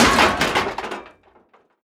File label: Crash Objects Medium Metal; gamerip; Car Simulator 2; android